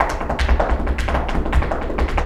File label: Flamenco, ZAPATEADOSOL